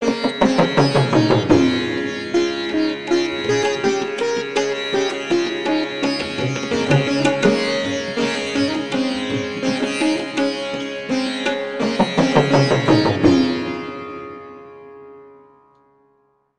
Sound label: Tuba